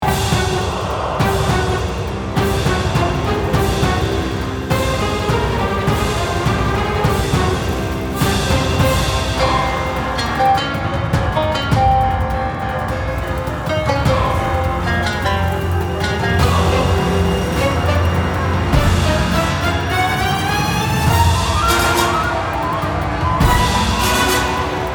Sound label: Boss Fight; Hielo